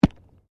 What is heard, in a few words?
Body movement Organic Impact Medium; Body movement foley to simulate falls and deaths; Wrestling And Struggling